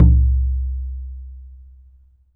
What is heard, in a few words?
POOK, KOREAN DRUMS; AKAI S6000 CD-ROM; WorldSounds